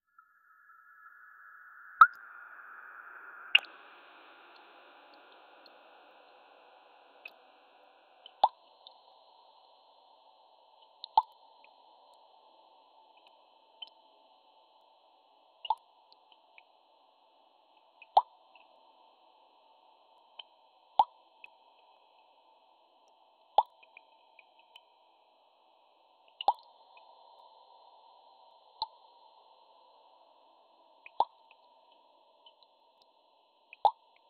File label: sound effect, highs